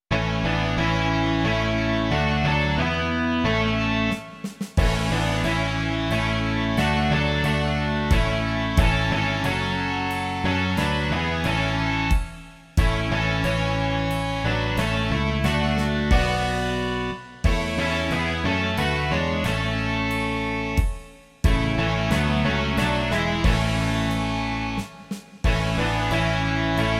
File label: rock